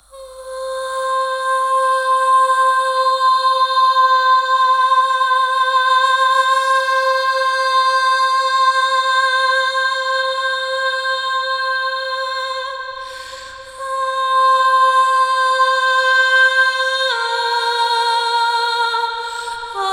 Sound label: Panned Ah Vocals - Cm 70bpm (WET); Construction Kits; Ghosthack; SkyRealm; Kit 19 - Ode To Daenerys